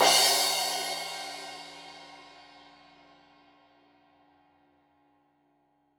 Crash 15in L edge, Cymbals Stereo

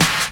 Dance Drums

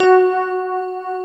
SYN K-2000, Roland

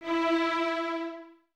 Violins E 4, String Ensembles; Miroslav Vitous; LINKS ORCH

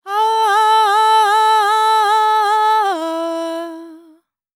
World Voices, INDIA; ILIO; Vocal Planet

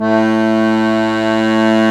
Accordians, Accord Trio; Club-50 - Foundations Roland

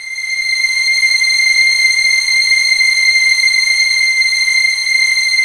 WARM VIOL, JV-1080 ORCHESTR; Syntec; Wall of Sounds VOL-2; ORCHESTR-EXP

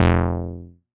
bass F1, ARP Odyssey

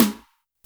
snare, Roland R-70

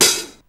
MD HH; Hollywood Edge; The Big Bad Beatmachine; XR-10

